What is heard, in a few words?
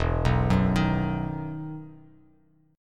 E Major 7th Suspended 2nd E down Bass Standard; EM7sus2 arpeggiated